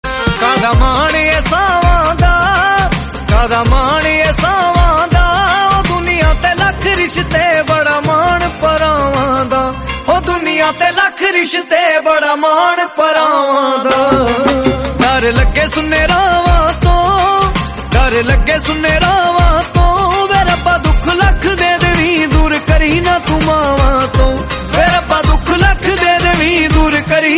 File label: devotional bhakti punjabi